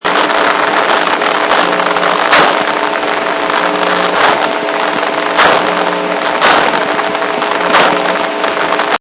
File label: Cricket Cerci